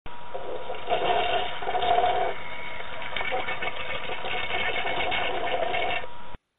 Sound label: flush